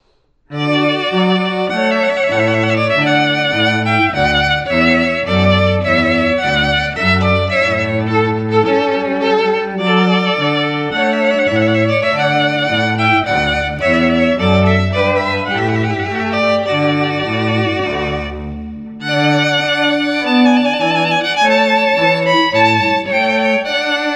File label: trumpet voluntary; clarke